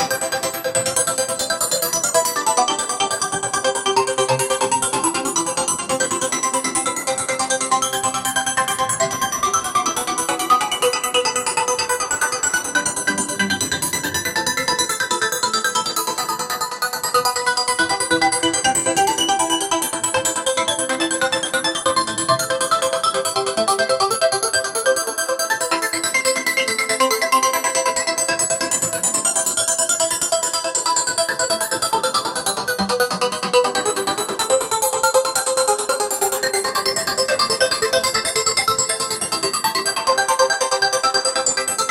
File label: christmas trance